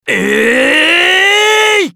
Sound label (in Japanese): 攻撃長; ガルシア